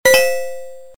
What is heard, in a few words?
Blackberry Sms Tone